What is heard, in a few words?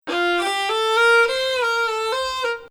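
Bayati Samai Ajam 3-6, Arabic music; Samai Bayati il-3ariyan; 2nd Ajam 3/6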